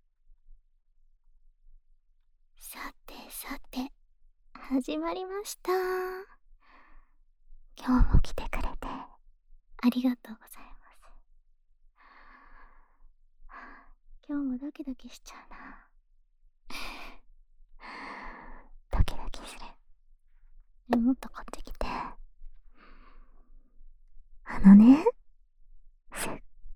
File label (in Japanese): ベロチュー煽り素股マッサージ, ASMR